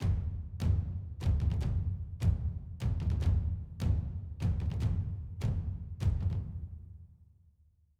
Cinematic Perc; M8; percussion and foley

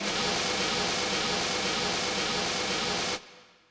Brush Swirl, Yamaha PSR E305

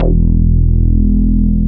BS VS BS, Analog Bass, Synth Bass; Roland LCDP02 Guitar and Bass

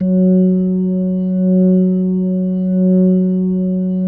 F#3S, B3, Vintage Keyboards

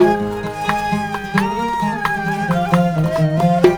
Oiental; Best Service; Ethnic Journey Sound Cube

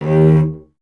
STR VC D#0, Cello; Miroslav Vitous; Solo Instruments VOL-1

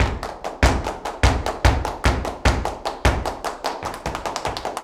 JALEO TAP, Flamenco

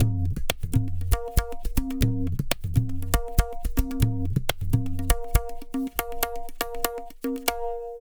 Tabla, 120 BPM; Zero-G; Pure Tabla

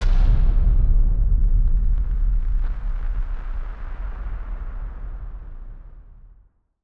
Firework FX; musicradar; bomb factory

